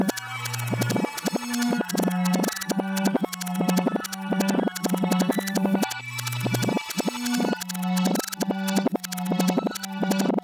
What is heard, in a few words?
Weird Reverse Tape Thing; Kount's Home Stretch Sample Pack